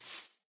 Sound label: grass, step; Minecraft